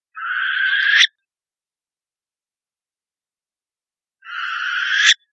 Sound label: owl